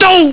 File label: doh; Viper